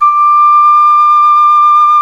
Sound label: KENA D3; World Combo